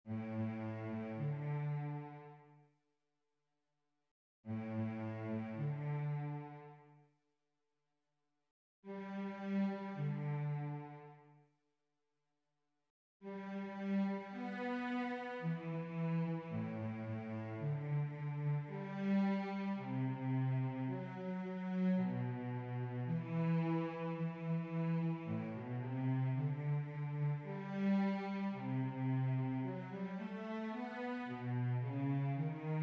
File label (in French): VIOLONCELLE